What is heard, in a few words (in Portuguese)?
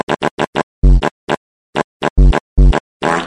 peido funk som